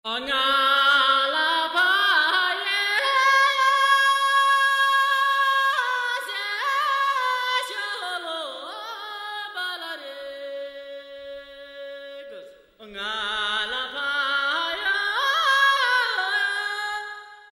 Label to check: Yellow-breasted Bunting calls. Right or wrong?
Wrong